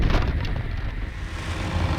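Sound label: Music Volcano Light 120; From Dust; PS3; Xbox 360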